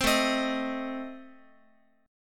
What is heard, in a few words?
A Diminished A, Guitar; 4A,4E,5A,5D,5G#,6C#; strummed; JGuitar